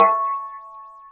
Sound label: combo power; tetrio